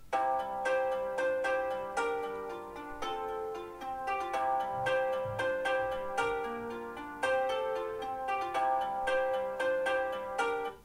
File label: ringtone; apple iphone 5